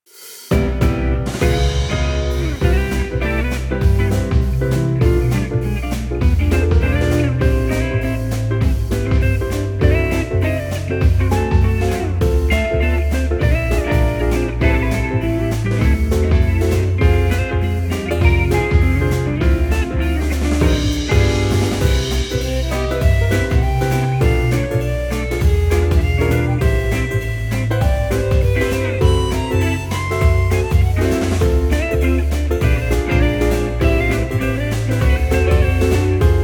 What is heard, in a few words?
bar soft